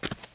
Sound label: SFT STEP; ageofwonder; Moves